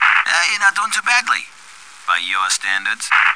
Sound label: funk fx; Breakneck